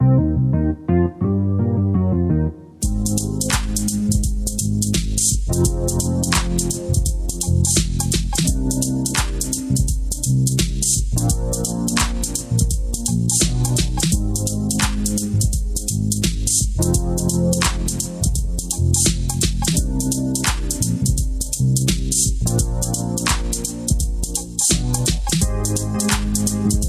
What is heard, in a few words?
Groovy Chill Funk